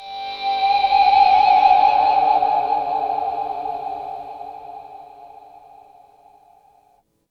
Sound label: GTR CHUCK, E.Guitar FX